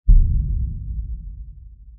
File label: power Click